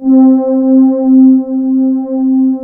ARP, Analog Fantasies; USB Soundscan vol.49; AKAI

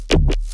weird bass

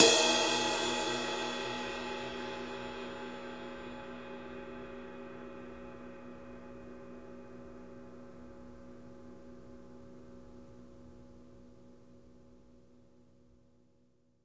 Supa Ride, One Shots

